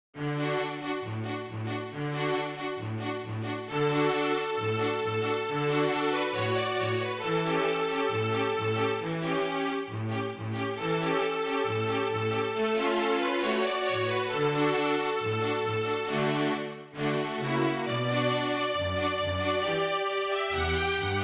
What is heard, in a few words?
Flute Trio